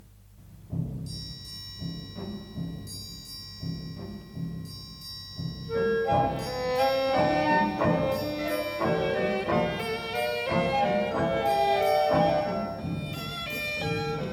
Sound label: Waltz; A Merry Suite